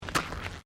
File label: Footsteps Concrete Step; Crocs; Footstep Foley; Footsteps (Sound Effects:Human)